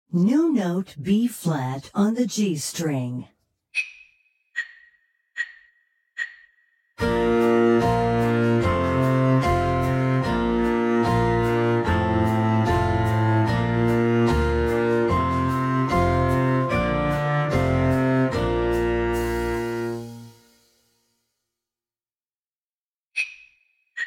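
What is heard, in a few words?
New Note Bb on G String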